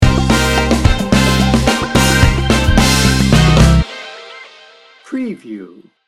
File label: funky feet sting bumper